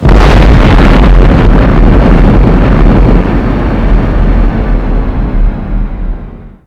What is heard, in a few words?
nuke, explosions, nuclear; trinity